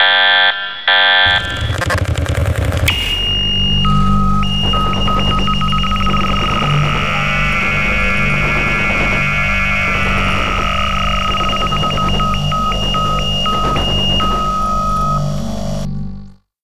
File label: warning; SOS Dan